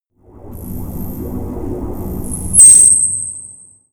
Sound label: space machine alien ring high